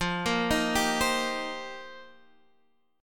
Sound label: F 7th Suspended 2nd Suspended 4th Guitar Half Step Down, chord